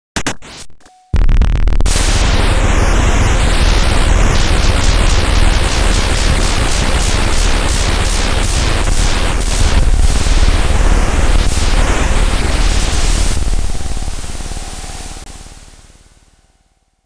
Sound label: Nuclear Explosion 核爆発; Elle; pc-98